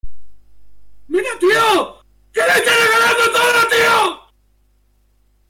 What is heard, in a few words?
intense cry